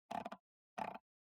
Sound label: PC Mouse Scroll